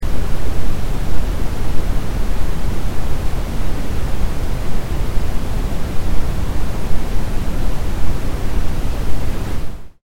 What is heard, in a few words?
Brown Noise